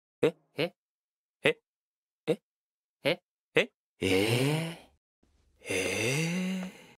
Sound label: eh eh ehhhh